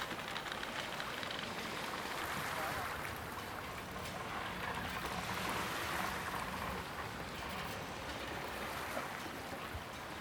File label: fishing village, ambience